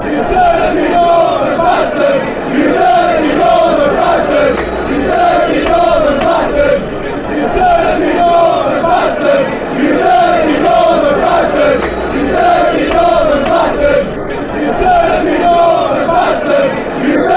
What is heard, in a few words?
you dirty northern bards, fanchants